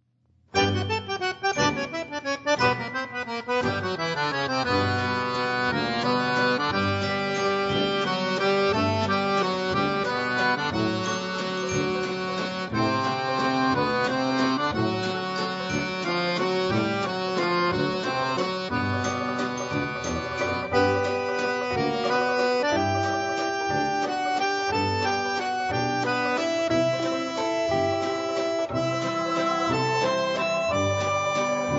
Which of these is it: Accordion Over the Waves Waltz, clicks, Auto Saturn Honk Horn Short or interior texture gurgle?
Accordion Over the Waves Waltz